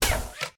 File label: hookshot Nothing